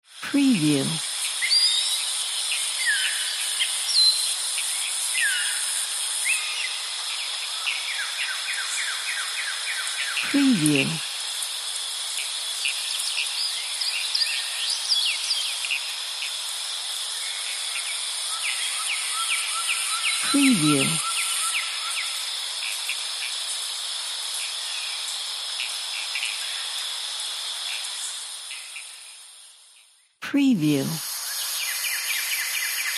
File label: birds